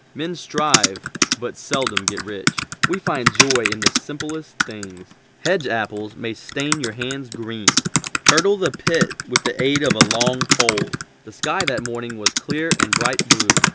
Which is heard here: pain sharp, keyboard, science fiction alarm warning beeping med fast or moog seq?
keyboard